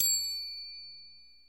klanger, Bells; Misc Percussion

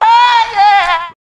"ah yeah" SUNG

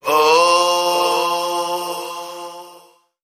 "oh" sung

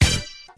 stabbed, stabs

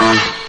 elephant charge

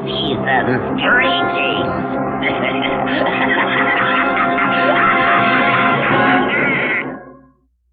season's greetings laugh; Voice; xmas

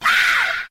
ow; Voice

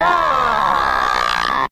"ah" pain, Voice; ooh ahh

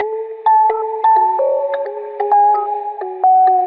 distortomatic, Loops Electronic

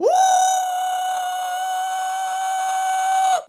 "woo", male

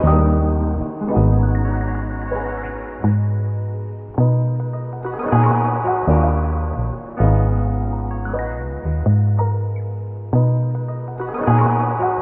keys, phonky loops

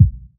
Kicks Soft

